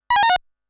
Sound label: Coin Credit; Namco Classic Collection Vol.2; arcade soundtrack